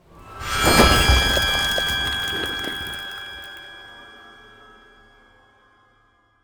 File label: Ice Spell Whoosh Frozen Pierce, Cold Whooshes; Arctic Aura